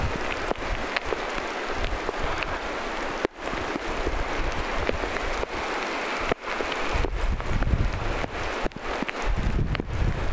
tama on vertailuaanien perusteella amerikantilhi mielestani joitakin sinitiaisen aania; Saaressa oli ilmeisesti amerikantilhi?

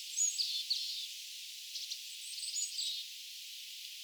tuollainen homotiaislintu

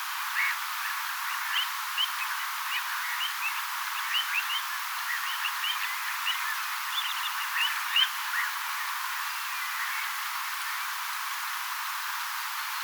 tuollaista pikkutyllin aantelya; tuollaistakin pikkutyllin ääntelyä; Pikkutylli soidinsi siellä lokkien pesupaikalla

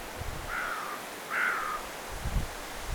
varis

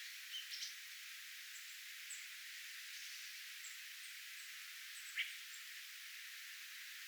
onko se laulurastas mika laji

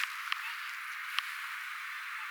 jankakurppa

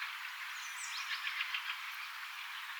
mita siina on sinitiaisia ehka

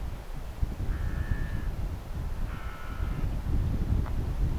varis

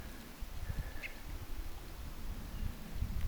räkättirastas

